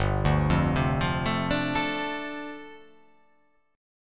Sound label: G 9th Suspended 4th